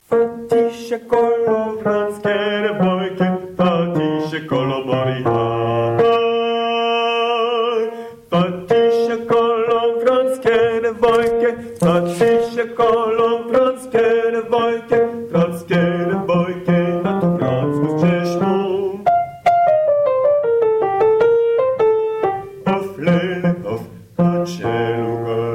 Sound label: Fatise kolo